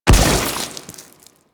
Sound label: Secondary Barrel Explosion, Weapons; Warframe; SU Modular Guns